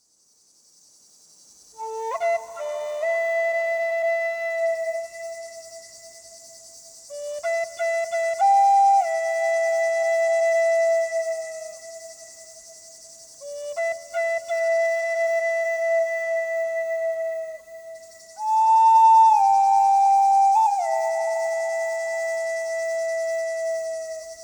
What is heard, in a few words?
The Cicada's Cry in the Evening, Native American Flutes & Sounds of Nature